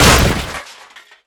Armour Hit With Rock; SFX Kit; Creaks, Scrapes, & Misc

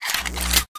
Gun Draw Select Scifi, Weapons Foley; SFX Kit

